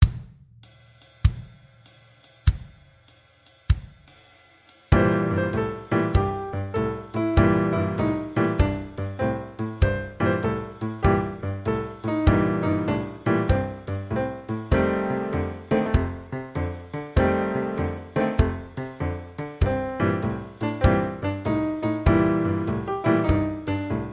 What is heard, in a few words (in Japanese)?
鍵盤楽器; ブルース; 後回しのブルース; 世⽥⾕区⽴ 芦花中学校 3年 A2 授業部門 歌曲以外; 優良賞（授業部門）; 第56回 東京都中学校 音楽創作コンクール